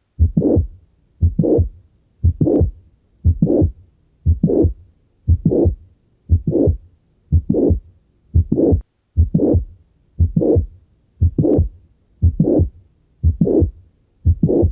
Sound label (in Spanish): Soplo del prolapso valvular mitral